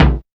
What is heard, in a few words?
silky kick drum single hit b key